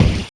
Wah Kick; Sonic Foundry (Sony Creative Software); Esoterik Beatz; Wah Kit

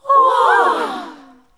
NOISE, CLASSIC VOX; Best Service; Hallelujah

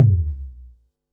Bassdrum, Pearl SC-40

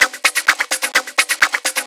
FX Loop 128 BPM, House

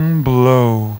LO REZ BLOW, VOCAL

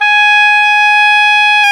oboe; Roland LCDP04 Orchestral Winds; Oboe Brth 1

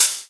Hat; M8; Electronisounds; Vibration Expedition One Shots

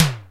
TOM, Simmons SD-8 Drums

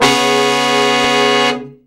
BRA SECT; BRA SECTIONS

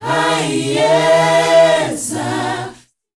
MIX.CHOIR; Spectrasonics; Heart of Africa 2

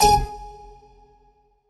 Dance Mega Synth; Best Service; 90 s Sample CDs

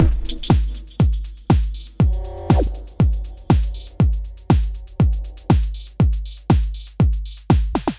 Reaktor Beat, Drumloops; Dr. Fink's Funk Factory

